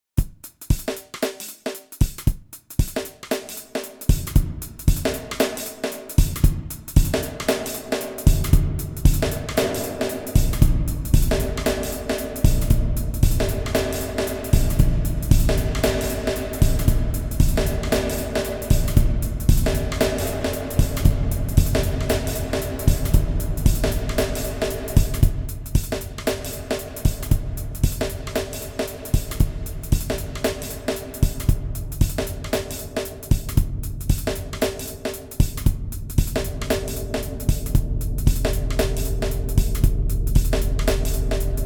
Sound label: Reverb